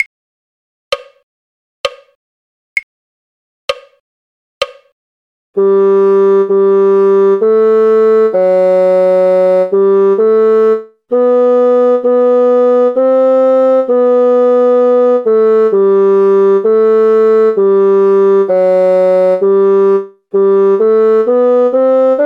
bassoon; god save the king queen; folk

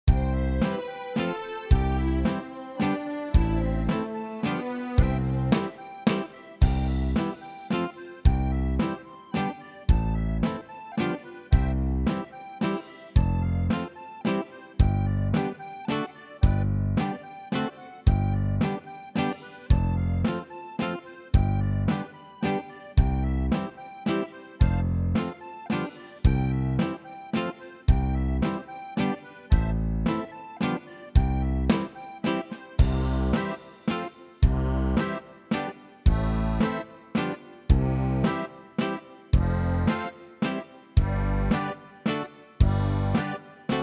backing, folk; bassoon; sweet polly oliver

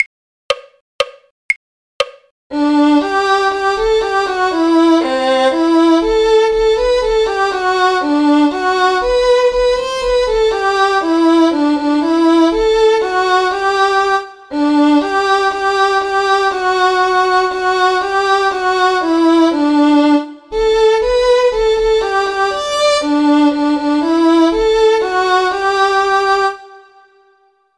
violin; carols

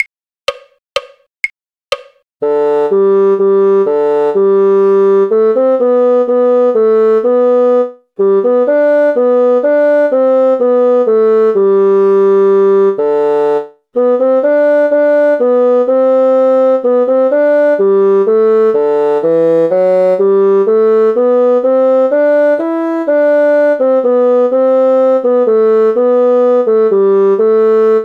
bassoon; sweet nightingale; folk

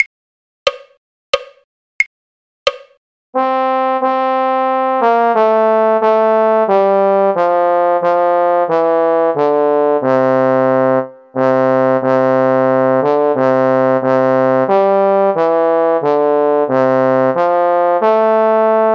trombone; carols

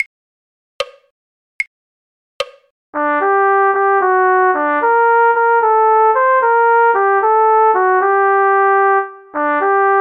the miller of dee cornet; folk

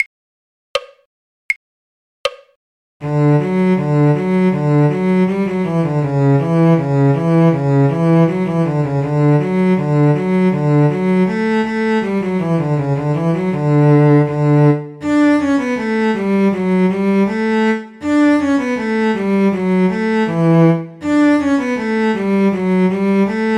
cello; the galway piper; folk

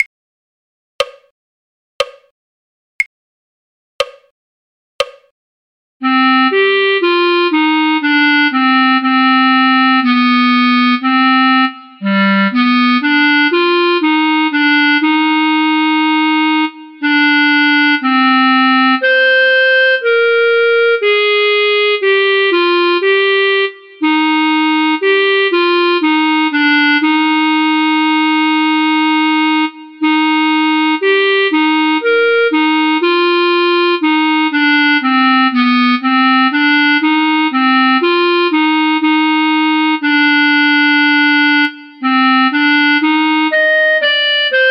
clarinet; david of the white rock; folk

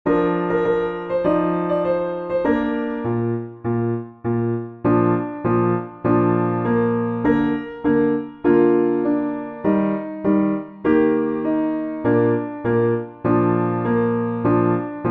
piano backing, hymns; Standing on the Promises

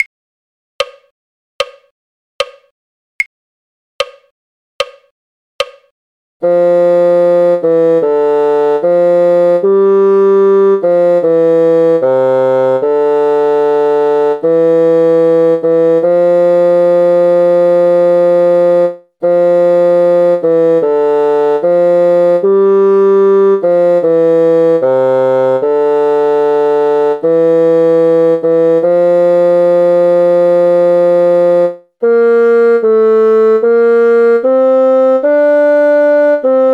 bassoon; folk

